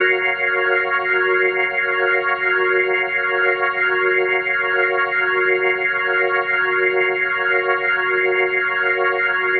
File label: Medical Organ F# Maj7; Major 7; The Vitale Organ